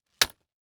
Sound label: Stroller Baby Stroller Set Down On Cement, Household